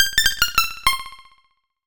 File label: Jingle; Dr Mario